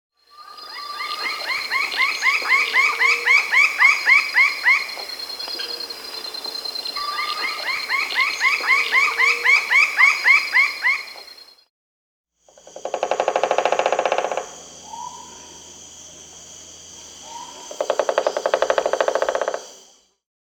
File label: Lineated Woodpecker (Dryocopus lineatus), Bolivia, Peru; S. America